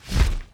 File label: Flap